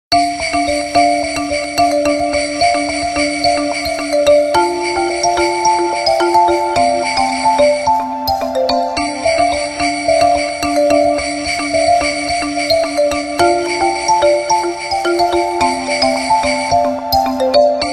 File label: snow ring; ringtones